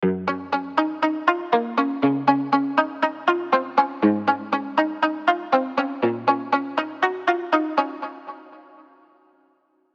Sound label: Guitar; Juice; Unison Deep House One-Shot Collection